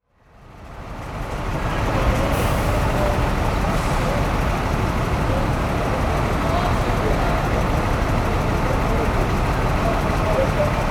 Brazilian City Traffic Scene; Sound Ideas

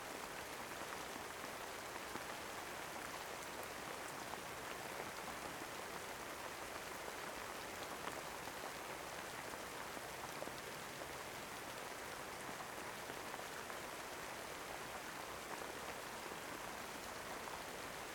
Rain Sounds, Natural Rain for Deep Sleep

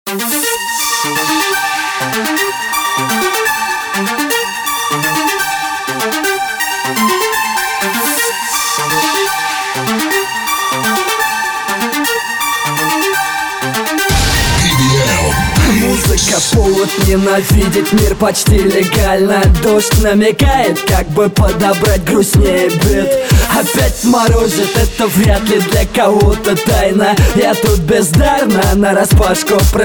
Jazz

